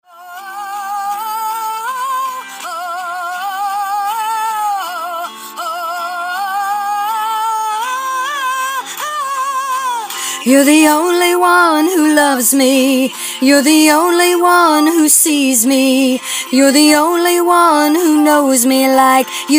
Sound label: you love me like forever F alto